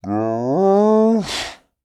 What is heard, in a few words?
Animal Mammal Carnivore Cat Wildcat Moan; animals; AdobeCC